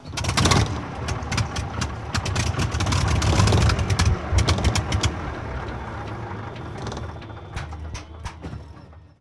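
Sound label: Plane P40 Interior Start Stall; Airplanes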